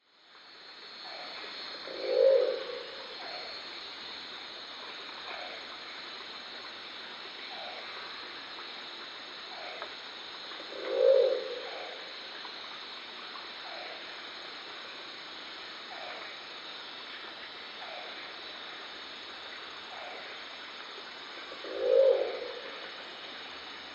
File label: Crested owl; جغد کاکلی